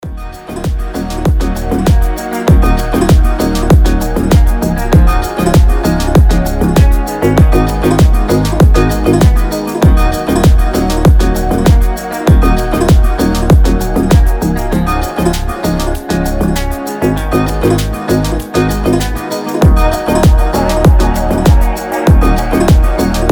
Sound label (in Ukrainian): Мандолина - Шикарна музика